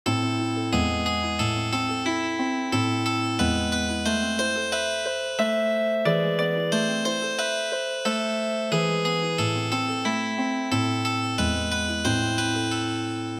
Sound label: Trio Healing Harp